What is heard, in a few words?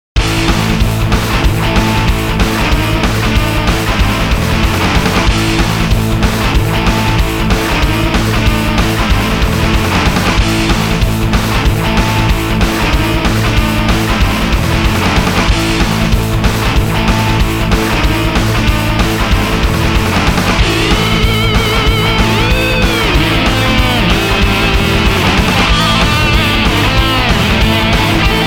Battle beat; Shin Megami Tensei Devil Survivor Original Remix Sound Track